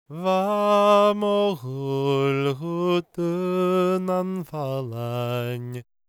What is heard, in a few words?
GAELIC, World Voices; ILIO; Vocal Planet